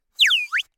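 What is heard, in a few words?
slide whistle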